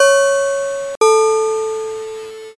pinpon bell

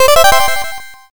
level up

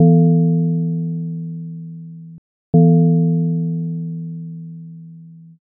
gong clock

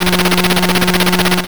slot spin

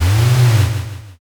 servo

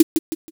m keytap

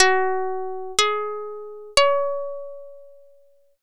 koto

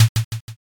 m keytap

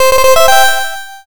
fanfare

